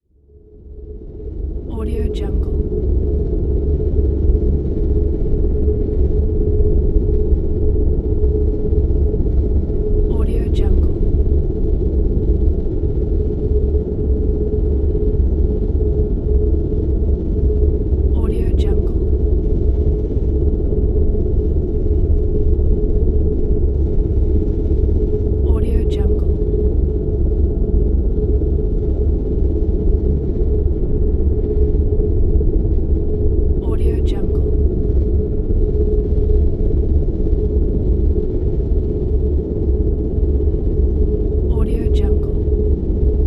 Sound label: futuristic ambient